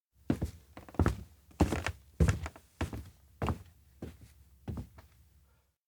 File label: steps indoor abandoned cafe walk; anemoi